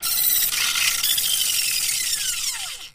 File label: Machine Film Synchr, variable speed